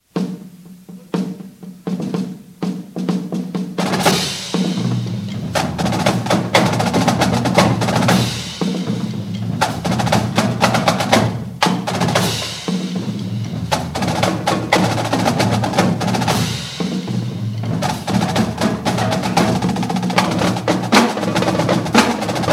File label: Sticks and Swing, drumline